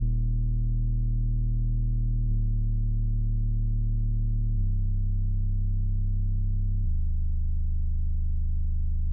D#maj 105BPM Bass, Music Loops; 99Sounds; Monosounds; PANDORA BOX!; Miami Beach

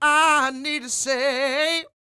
SING W, Vocal Planet Blues & Soul, BLUESY; ILIO

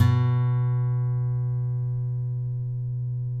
COLLINGS B 2, Guitars; Spectrasonics; Hans Zimmer Guitars VOL-2; FNGSTYLE PFM

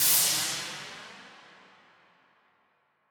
Noise Verb Decays; stereo toolkit samples

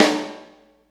SD, 180 BPM; Ueberschall; Drum & Bass Flash Trackz

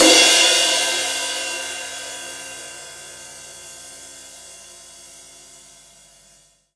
CYMBAL, CRASH; Sonic Foundry (Sony Creative Software); Drum Tools; Laidback

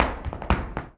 Flamenco, ZAPATEADOSOL

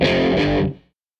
Tele Guitar Loop

